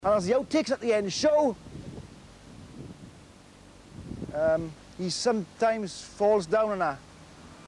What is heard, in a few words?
fall